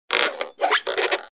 squeak; disney; mashup

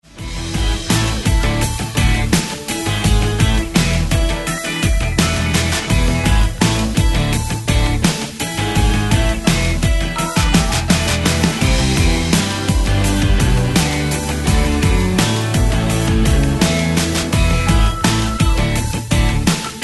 karaoke; disney

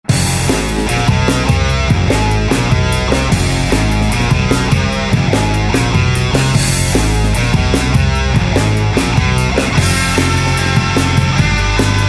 Rock Power; disney; mashup

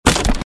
wood hit; camp rock; rock n row

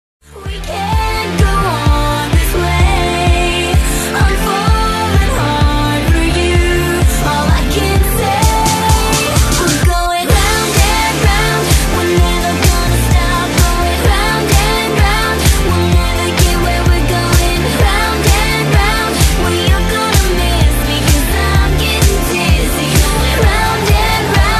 karaoke; disney

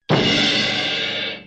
crash; disney; mashup

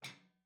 bird; starwars; the force awakens

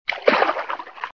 row; camp rock; rock n row

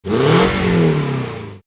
Rev; Boost; Eclipse; Cars

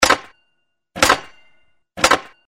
ui count; disneyxd; stuntastic

